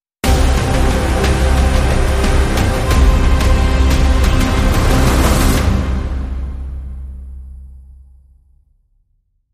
Long Sting

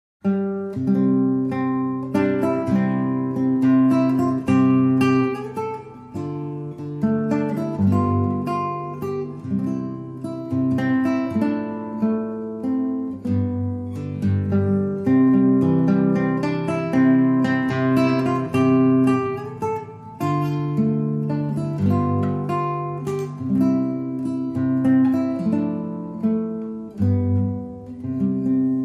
Solo Guitar

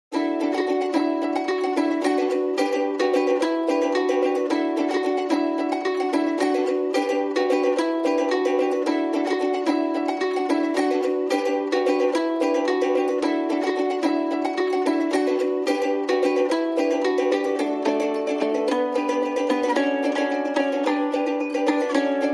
Guitars and Ukulele Only

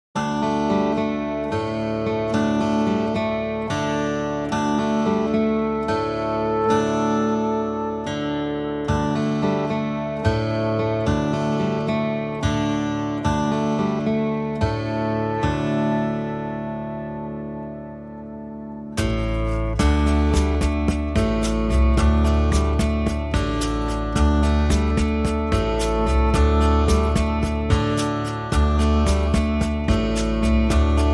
No Lead Guitar

